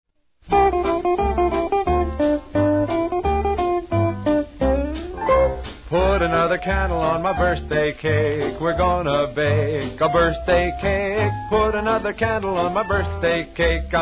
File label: birthday polka